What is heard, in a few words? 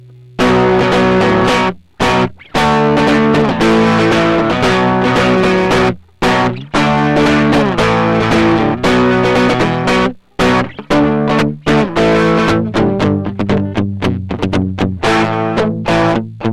Classic Rock, Distortion